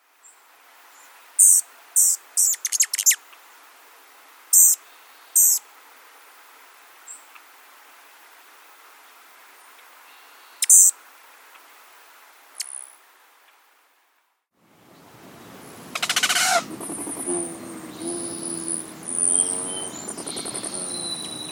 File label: Rufous Hummingbird